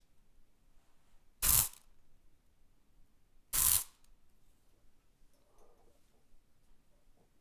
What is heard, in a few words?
Spray